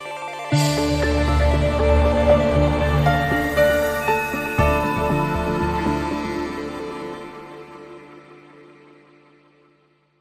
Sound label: Sonic Piano